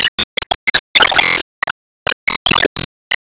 Enemy Evolution Active Shoot

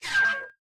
Kill Baboon; lethal company

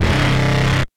Bass

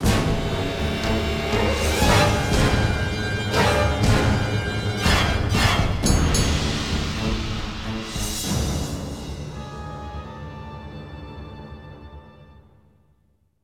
Bosscombat Lose; The Hobbit; PS2

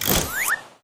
Volup

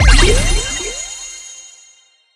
Claim Reward Daily Mission; subway surfers city sound effects; Android, iOS

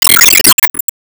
Enemy Factory Summon Motion

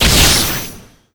Zantetsuball Hit; disney star smash android ios mobile gamerip 2020

